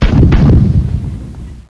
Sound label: ANTI-AIRCRAFT 8Bit; abbottabad compound